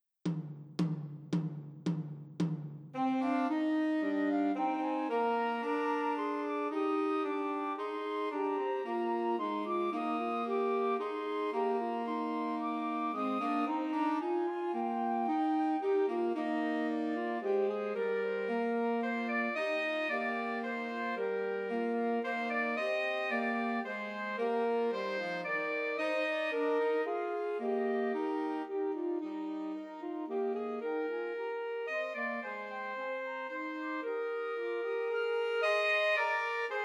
The First Nowell, Christmas Trios for Flute Alto Sax and Tenor Sax